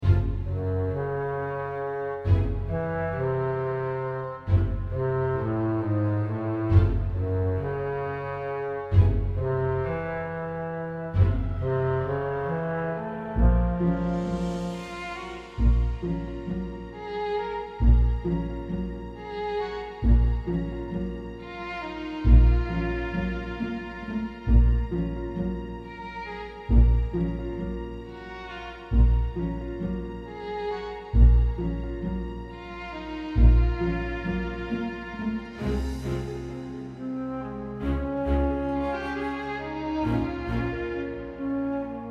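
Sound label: Chateau Slow Amb loop; the da vinci code xbox 2006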